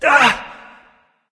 nv sigh; smite; voicepack; mercury

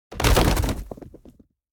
death afflicted bodyfall, manticore; smite

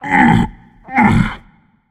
grunt lift; smite; geb

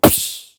umbrella rush snare; lilmana; smite; vamana

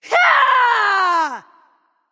battlecry; smite; thanatos

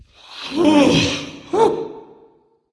nv sigh; smite; voicepack; xbalanque

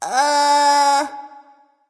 nv confused; cupid

